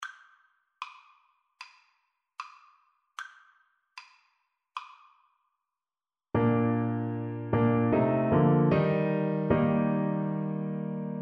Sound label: how great thou art, Tuba